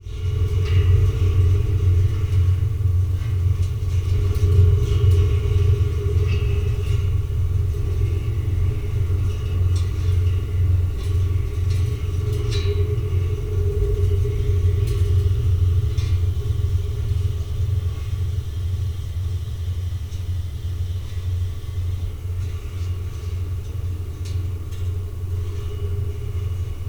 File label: farmgate